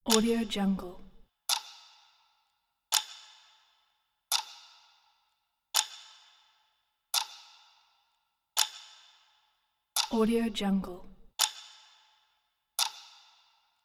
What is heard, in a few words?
clock; fx-market